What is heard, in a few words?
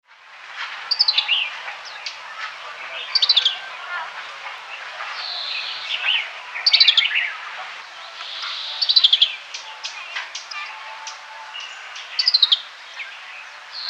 black headed greenfinch